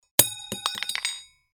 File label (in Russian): Padaet vilka